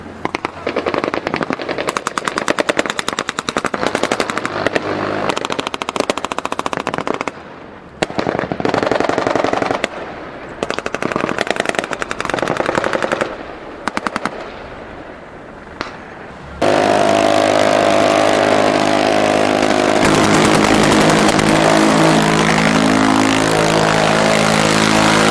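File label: gunfire and boat motor sound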